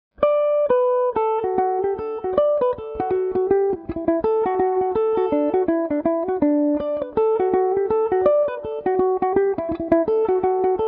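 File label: D major, Irish mandolin; kilfrush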